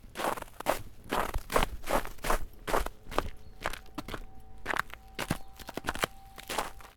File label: Quick Steps On Icy Snow